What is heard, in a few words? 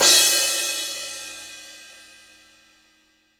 sfx perc